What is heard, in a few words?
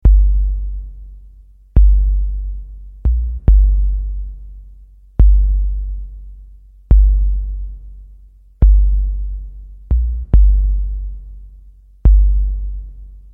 Woofer Bass Test